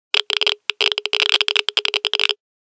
Geiger; Windows Phone apps

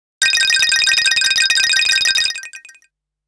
Digital, phonetones Nokia; Nokia E7

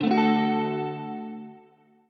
summer surf; Google; Google Sounds

